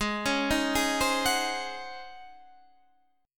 Db Major 7th Suspended 2nd Suspended 4th, Ab, guitar